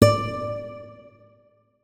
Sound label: notes, guitar; Hideout Furniture